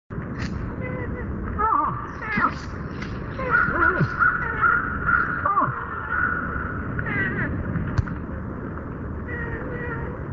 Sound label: moose